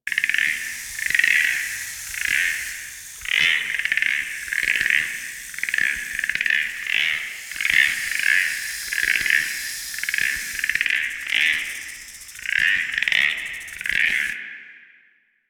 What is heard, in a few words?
Infested Stop Pod Detect Loop; Warframe